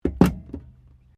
CAR HOLDEN HD PREMIER GLOVEBOX CLOSE, Classic Australian cars